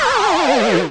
after boom

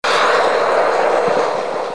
rocket up

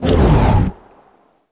crit stop; win98

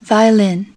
viol

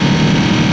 CAR; NFS3 Cars

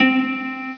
Harp C4; Star Trek JR Audio

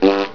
miss letter; Hang2000 v1.1

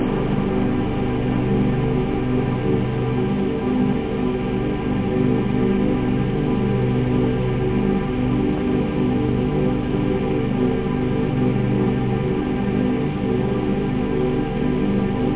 choir, synth chords; da capo vol1; instruments

